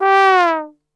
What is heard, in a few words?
G 2 TRB, Brass Super Section; Best Service; 90 s Sample CDs